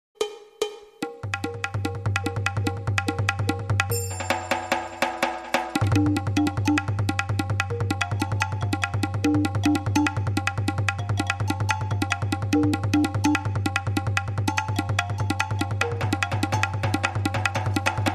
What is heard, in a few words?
Indian Loop